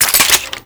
Reload A; Kuva Hek; Weapons; Warframe

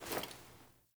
Foley Action Block, Default Clothing

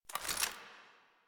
AK47 Reload Mag Align; Warframe; Tenno; Weapons

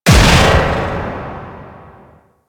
Riot Moa Shield Smash; Warframe; Attacks

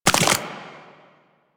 Proto Infested Maggot Step; Warframe

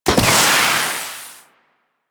Flying Spaceman Jetpack Burst; Warframe; Venus

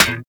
Melee Staff Impact Generic

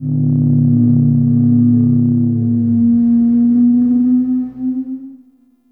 Exterior Eerie, Ambience; Warframe; Duviri

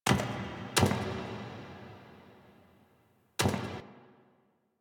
Sentient Throne Room Steps; The New War; Ballas

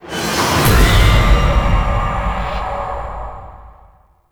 Floor Enter Stinger; Warframe; Tau; Circles Of Hell

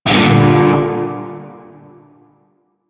Shawzin Min Chord; Warframe; Narmer Shawzin

